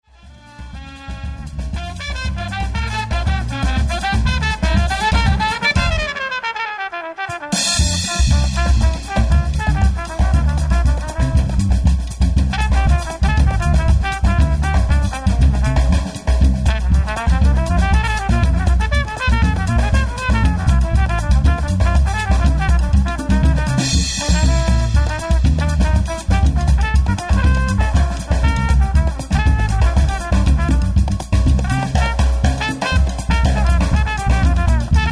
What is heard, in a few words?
Trumpet Solo